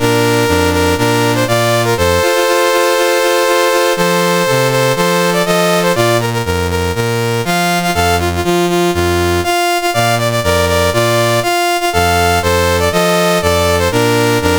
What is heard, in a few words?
CSG8580R5, National Anthems; Oman